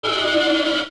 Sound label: Dodongo Exhale; zelda; OOT